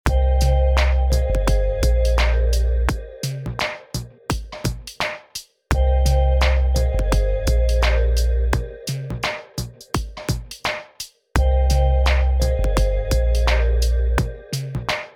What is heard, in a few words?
loop sad